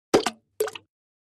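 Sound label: Splash Small Plop Plastic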